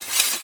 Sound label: sheathe sword; warrior; Aragami